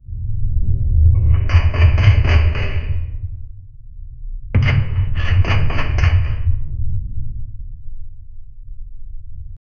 vault parkour

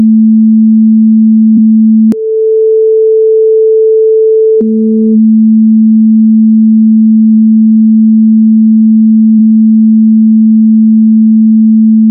music sound to, meditation

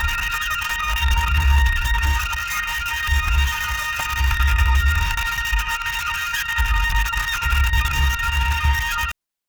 scratchy organ

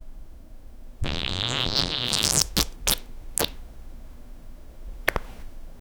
messy low tone fart; sound effect; body sounds; messy low tone fart squis

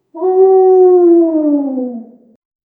lion voicing

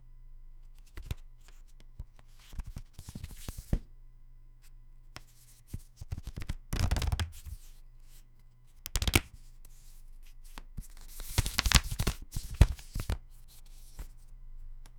sound for pulling a card

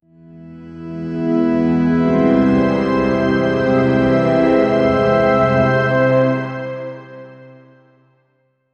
brief hopeful melody, music

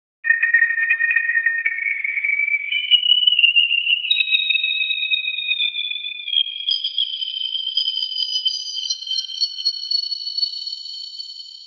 magical time stopping; time manipulation

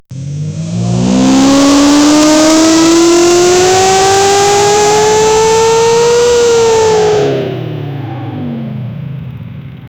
a throaty formula one eng; 1990s

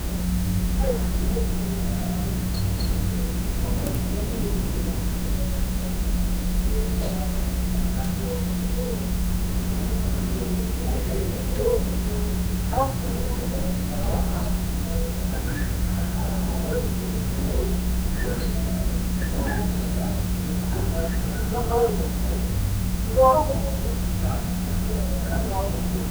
human activity distant

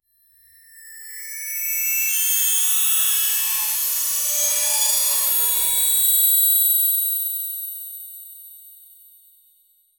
sparkle shiny background

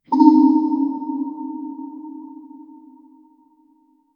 add a short echo

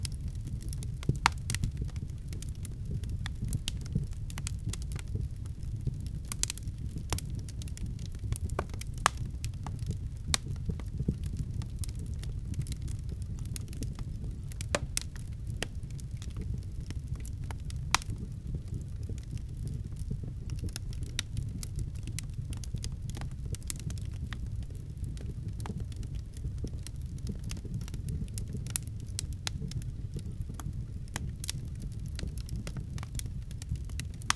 fire place and rain sound

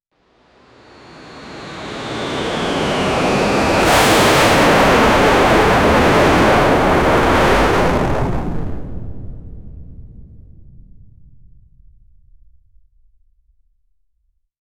ultra fast jet sound appr

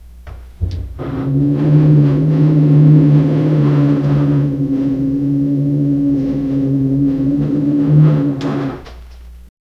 low deep rumbling sound, door

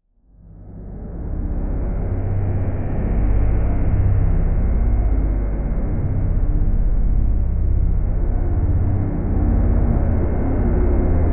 dystopian cyberpunk city

